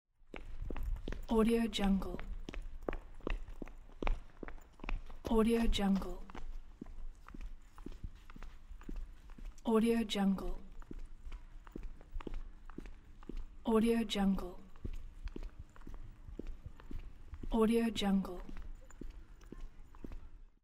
footsteps running on asphalt

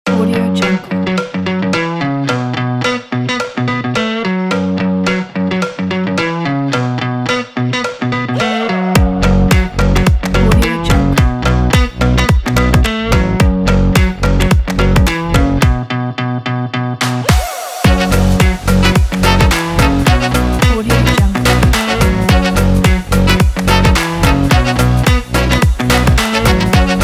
upbeat funk energetic fun action; marscottmusic

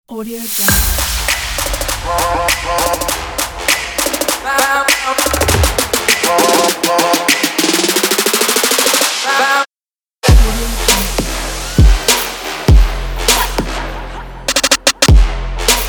trap

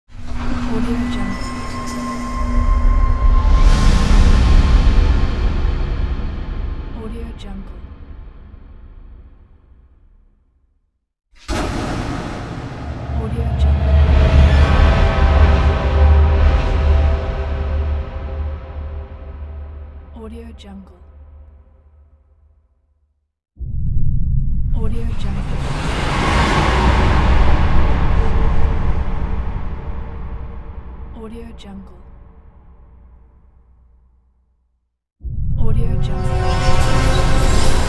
dark horror

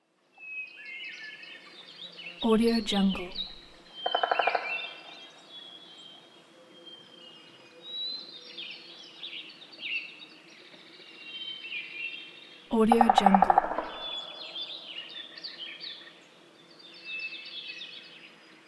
forest ambience with woodpecker; moonaudioproduction

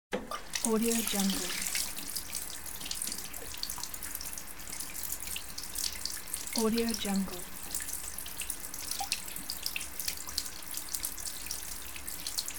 tap water

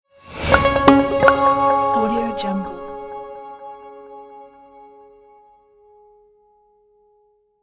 quick logo sound

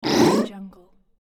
robot suprised

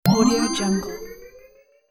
button activate; sonic-boom

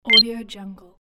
data entry beep

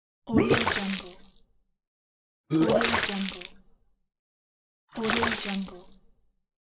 puking on the floor

